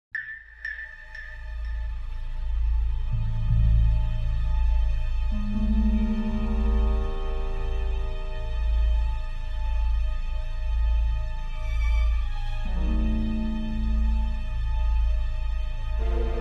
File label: hallway music; Hector Episode 1 We Negotiate With Terrorists